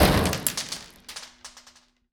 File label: Death; Pinata Smackdown